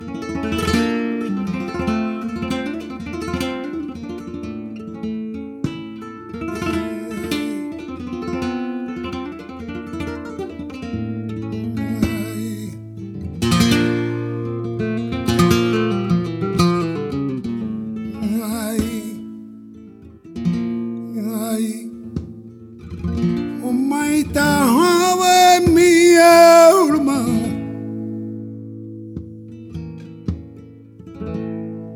Solea, flamenco; del Morcilla

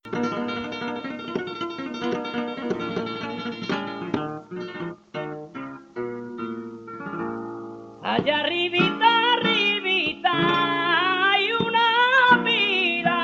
Bamberas por Huelva, flamenco

